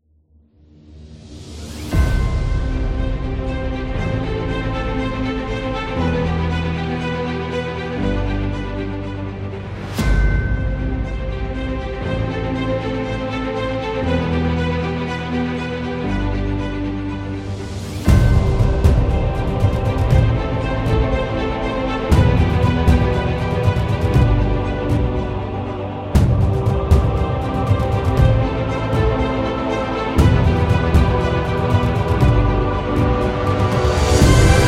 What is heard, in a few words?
Epic Action; self motivation